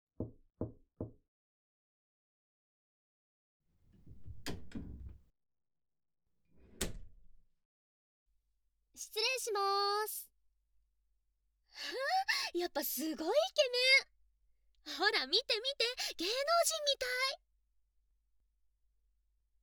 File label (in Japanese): 浮気交尾; 浮気交尾、してい？